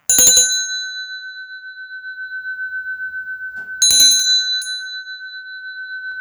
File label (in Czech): zvonek velky, tibetsky zvonek; tibetsky zvonek nejvetsi